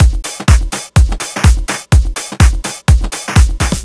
Full, Drum Loops 125bpm, Nu Dance Electro; Chicago Vibe

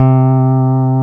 PEDAL STEEL

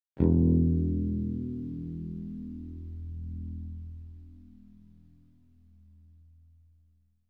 bass E1; KORG - Sound Sampling Collection VOL-5 - Drums & Bass Fusion Funk Effect

